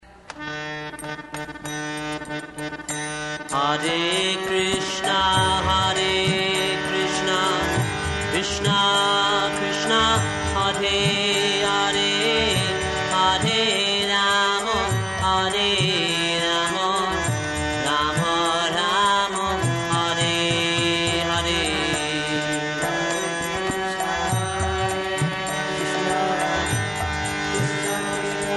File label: Sweet Maha Mantra